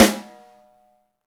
snare, Roland; Club-50 - Foundations Roland; Ext.Snare 1

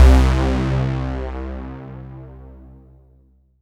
C3, Synth Line

